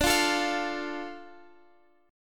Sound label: D Minor D Guitar; Dm strummed; 22,20,19,22,x,x